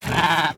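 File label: Llama Angry; minecraft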